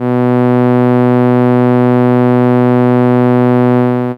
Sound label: Cello B2, ARP Solina; Unmodulated; musicradar-synth-samples